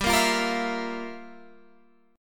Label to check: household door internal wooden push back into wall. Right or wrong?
Wrong